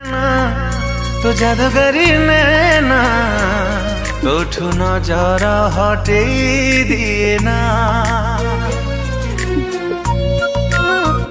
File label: Romantic Anthem